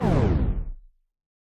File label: Boss Core Death; Avie